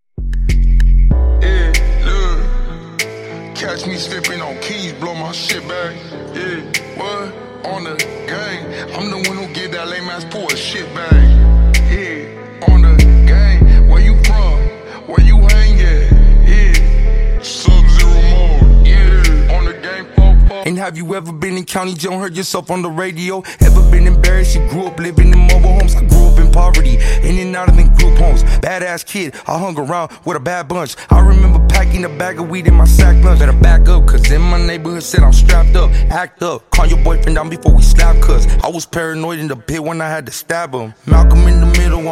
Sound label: 96Bpm; Redrum